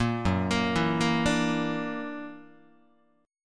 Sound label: F sharp Augmented 7th, down, Guitar; arpeggiated; 4A,4E,5A,5D,5G#,6C#; 1,2,1,2,2,1; JGuitar